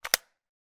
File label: gun ak 47; still north media